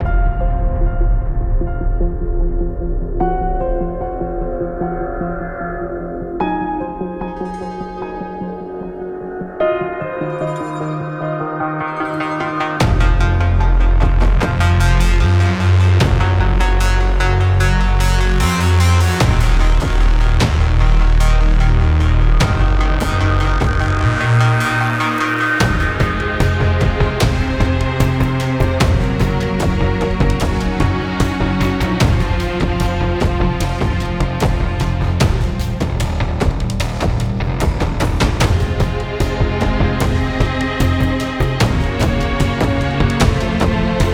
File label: Lost Pigeon Whistle